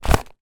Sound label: leather wallet down on table; foley; zapsplat